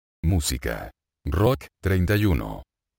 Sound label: rock